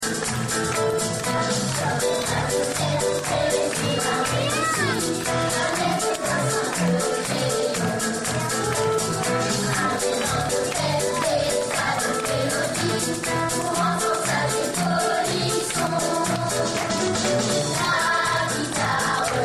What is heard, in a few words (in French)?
guitare moustache